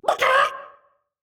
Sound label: Warrior Death; Realm Royale; Archangel